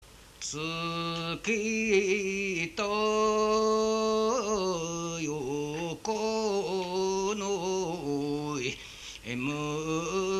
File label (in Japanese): mugitukiuta, minyou